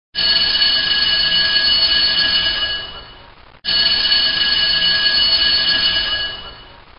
传统下课铃; 信号类

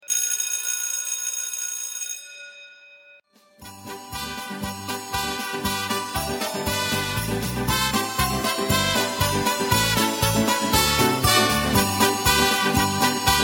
下课铃声; 信号类

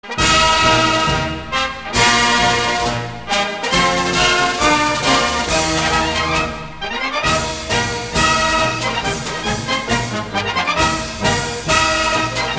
欢迎进行曲; 运动场

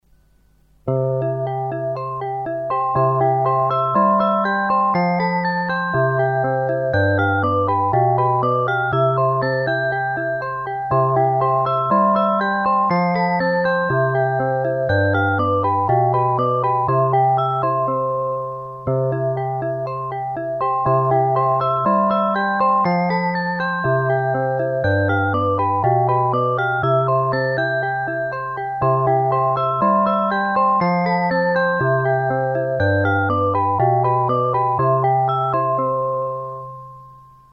离校音乐; 学校常用铃声

